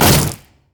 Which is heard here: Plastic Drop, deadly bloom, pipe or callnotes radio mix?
deadly bloom